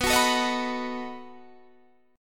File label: B 7th B down; strummed